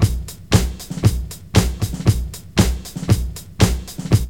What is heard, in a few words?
DISCO LOOP; Zero-G; Funky Elements